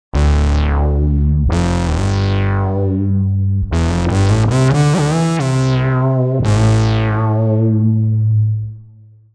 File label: Bass; TURBO VL